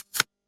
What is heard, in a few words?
card Swoosh